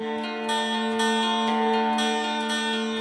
Mid Section Music; ether one redux gamerip 2015

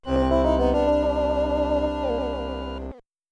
Death; Sonic Hellfire Saga Grand Finale

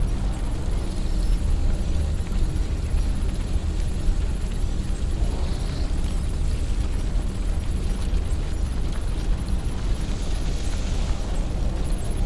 ambience watchtower; LEGO Batman 3 Beyond Gotham

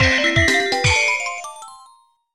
New Move Unlocked!; crash of the titans gba soundtrack

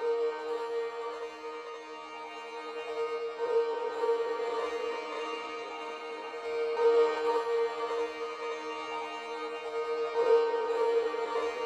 Open Tones High

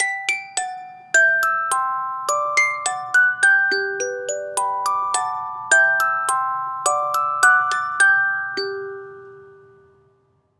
Music Box; Assassin's Creed Syndicate

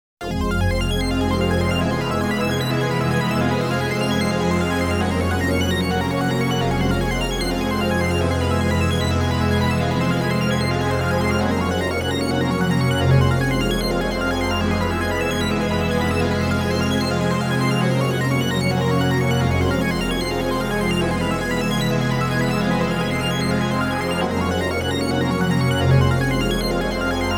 Warning; Rockman X; Mega Man Anniversary Collection

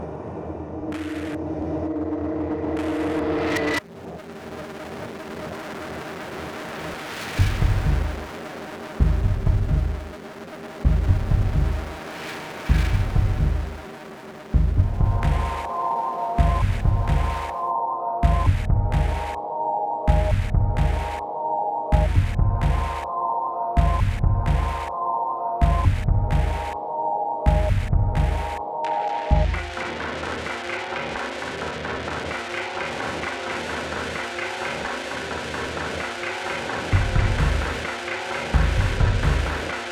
Song Boss; Bit Dungeon III

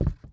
footstep wood; Don't Be Afraid The First Toy

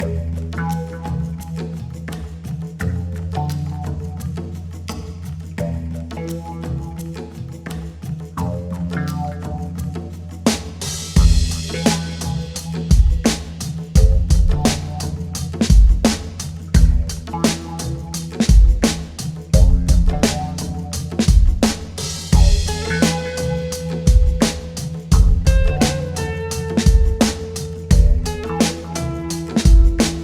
music level first

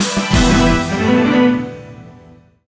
Battle Clear; Dillon's Dead-Heat Breakers; Nintendo 3DS

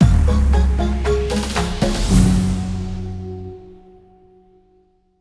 Boss End break

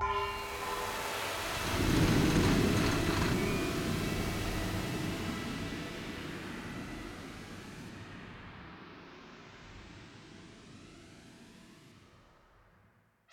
Music; Wug Music Hideout2

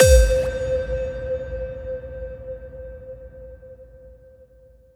Final Points Impact

